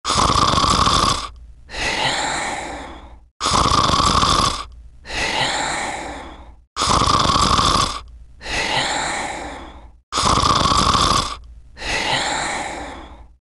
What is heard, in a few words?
sleeping; sonic